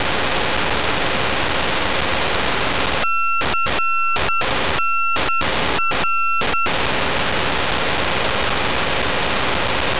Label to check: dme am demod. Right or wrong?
Right